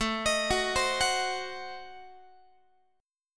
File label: A Diminished 7th A down Guitar; arpeggiated; Adim7; x,17,18,16,16,17